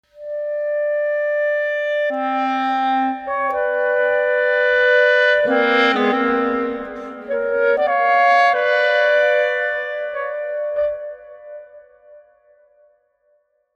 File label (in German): MELODIE VERSUCH; gullinkambi; 1-2stimmig